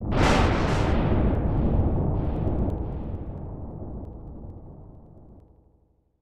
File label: Thunder; demon of laplace; snes